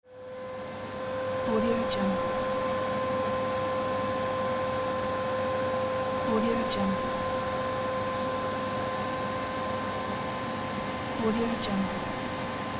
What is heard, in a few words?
vacuum cleaner; incredible-sound